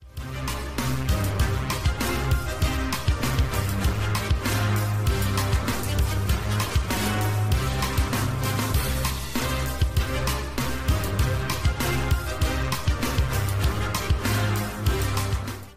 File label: droid march remix